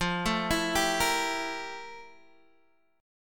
F Major 11th Guitar DADGAD

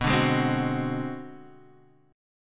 B Minor 6th Flat 9th B, chord

